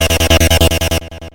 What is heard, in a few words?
Rolling